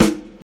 vinyl full rock snare sound E key, Royality free snare one shot tuned to the E note